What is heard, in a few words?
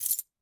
keys step high; DeadFright